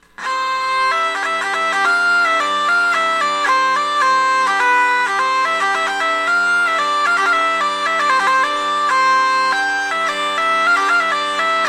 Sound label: mazurka gc schnarre natural, vielle; pilger; gordon